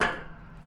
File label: walk metal